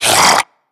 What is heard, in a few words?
choke, zombie vox; creatures